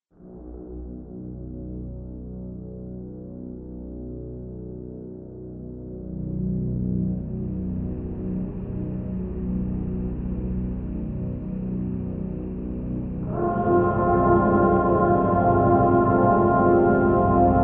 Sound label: sub bass tubes; NI arkhis